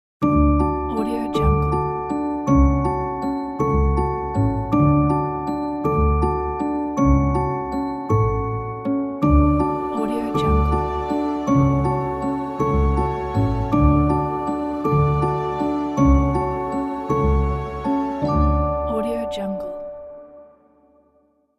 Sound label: fairy tale